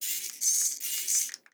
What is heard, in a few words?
servo robot mini toy two leg spinner; soundmorph